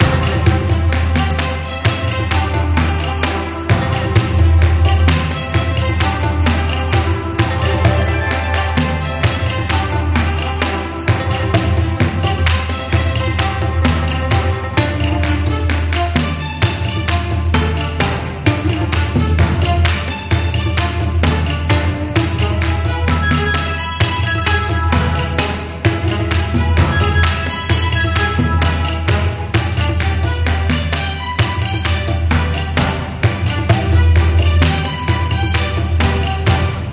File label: Bonus Music Loop; Long Mu Fortunes